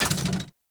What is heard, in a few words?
Mech Step Arm Up; Nechro